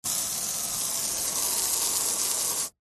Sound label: vehicles car windshield spray water jet from hose short ext